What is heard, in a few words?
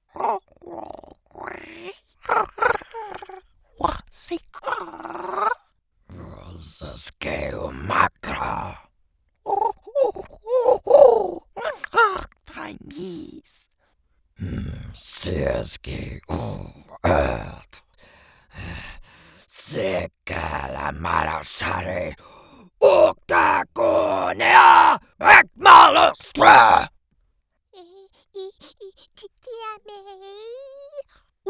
Creature ALIENS, creatures vocal extremes; Creature Demo